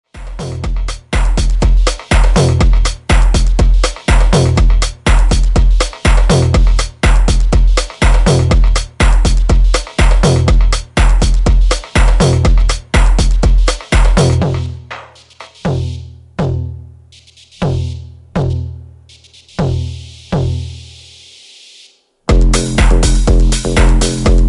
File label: Instrumental Mix